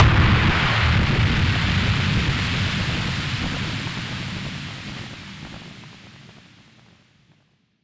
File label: Noise Bomb; musicradar; bomb factory